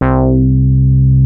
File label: FAT BASS, BASS STATION; Syntec; Wall of Sounds